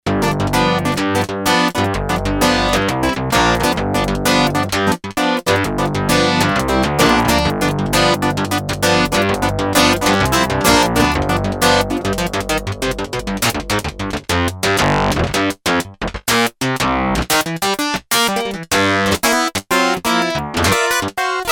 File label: Clavi Stuff, Yamaha TX802; Factory Patches; Voices